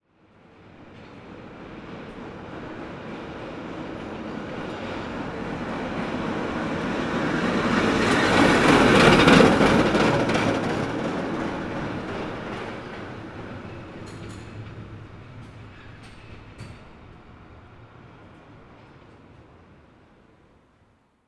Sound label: cable car pass by; transportation